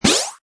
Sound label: Cartoon Jump, Jumping, zip up, sweep up, whirl up, twirl up, magic; Comedy/Cartoon; disappear; Funny Sounds; Lynne Music